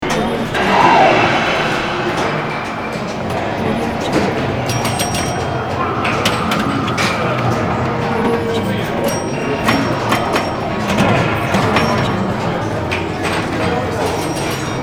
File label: casino ambience